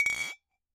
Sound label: Bottle Struck